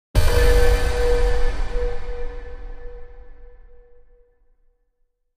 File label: Impact Metallic